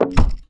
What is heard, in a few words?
Reel Bounce